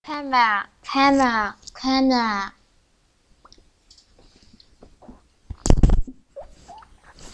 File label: camera